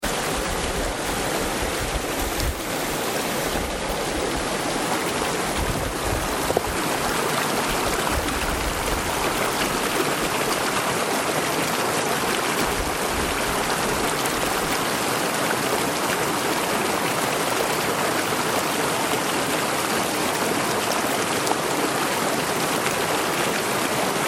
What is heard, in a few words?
Fast Stream Big Cottonwood Canyon; Peak Sounds